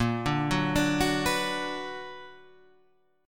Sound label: B Minor Bb, Bm/Bb chord, guitar; arpeggiated